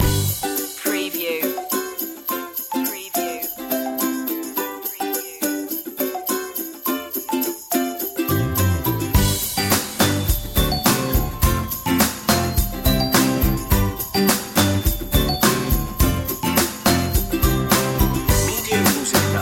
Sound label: easy and fun loop